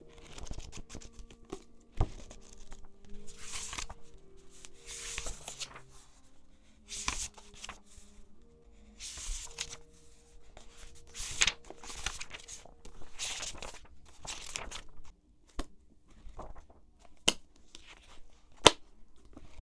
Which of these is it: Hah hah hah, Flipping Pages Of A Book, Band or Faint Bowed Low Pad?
Flipping Pages Of A Book